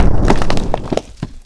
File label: Explod; breakout; farm